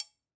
Triangle E Mute; Latin Percussion; Drums; Drum Hits; Bell; Ableton; Factory Packs

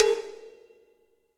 Cowbells Low Loud Squashed; Drum Hits; Ableton; Factory Packs; Session Drums Club; MPE Hits

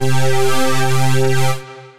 Largeness Pad, Synth Pad; Ableton; Beat Tools

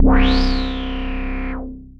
Sequence Tapper, Bass; Basses; Ableton; Creative Extensions

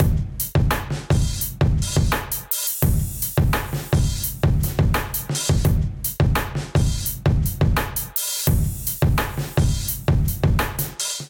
Kit 85 bpm, Drums; MIDI Clips; Canvas; Ableton; Factory Packs; Chop and Swing

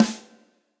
Snare Hit & Rim Cold Tight Room; Drum Hits; Ableton; Factory Packs; Session Drums Studio; MPE Hits

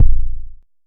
analog deep, Kickbank; Ableton Foundation FreePacks

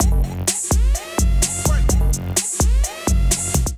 Big Break Kit groove 127bpm

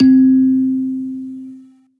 Bedroom Kalimba; Multisampled; Ableton; Mood Reel; Majestic Mallets

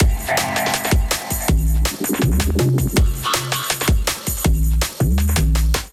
Breaks Kit Melodic 162 bpm, Drums; MIDI Clips; Rollin Breaks Kit; Ableton; Factory Packs; Skitter and Step

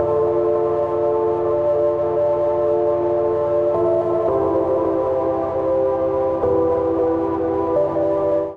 C Major 112 bpm; MIDI Clips; Tonal; Accompaniment; Arctic Shadows; Drive and Glow; Ableton; Factory Packs

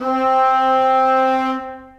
Woodwinds Ensemble Legato; Ableton; Orchestral Woodwinds

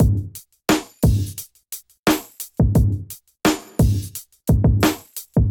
Kit 87 bpm, Golden Era Hip-Hop Drums; MIDI Clips; Wild Emerald; Sound Oracle

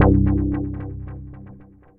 Bass; Symphonite

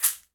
Caxixi Open; Shaker

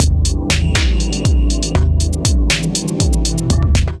Glitter Kit 160 bpm; MIDI Clips; 150 - 180 BPM; Ozark Glitter Kit; Ableton; Factory Packs; Skitter and Step

